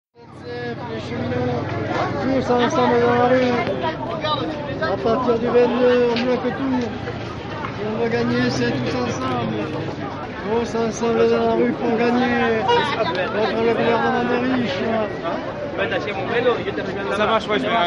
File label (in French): ambiance marche; marche des capus